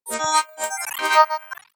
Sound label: Computer alerts; ComputerSFX; soundbits